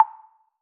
Grab

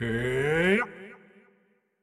WindUp Long; Marv

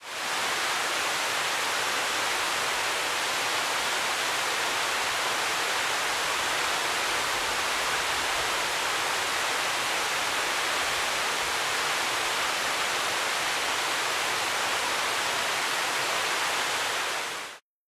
Hoover dam Fall

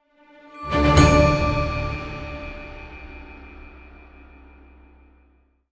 stinger Level Up; lego horizon adventures

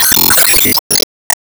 Timebomb Explosion; gamerip

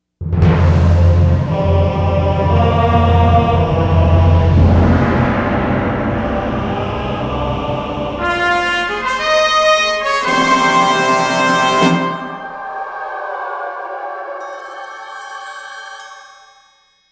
Boss Intro Music; Ben 10 Protector of Earth; Wii

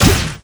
Bounce Ball Hit; gamerip

